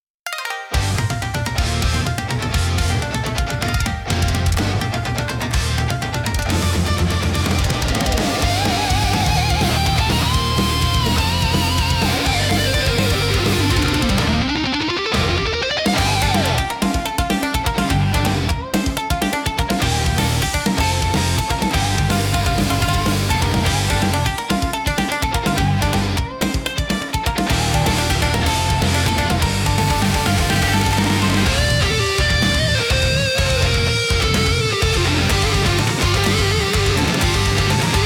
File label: Thunder On Shamisen, intense japanese rock; for modern and stylish combat